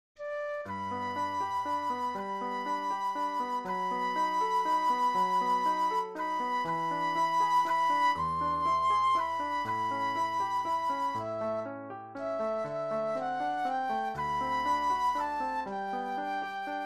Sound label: Flute Solo; classical